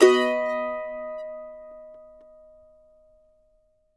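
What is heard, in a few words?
MANDO MAJ B major up, Right; Sampleheads; Whole Lotta Country 1 & 2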